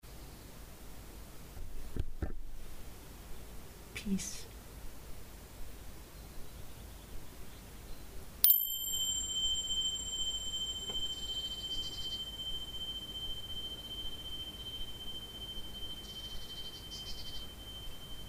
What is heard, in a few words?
bells; Peace